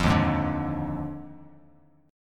Eb Major 7th Suspended 2nd Suspended 4th Eb Bass Standard; strummed; 13,6,6,7; EbM7sus2sus4